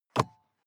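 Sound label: sports car interior compartment close soft; verkehr transport; autos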